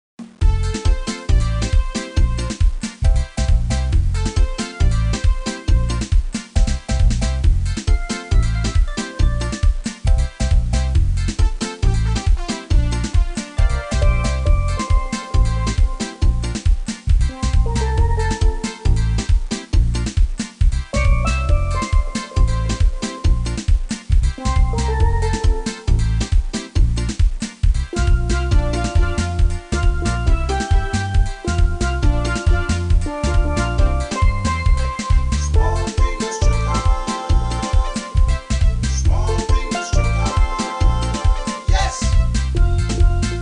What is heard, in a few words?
small pin, steel drum band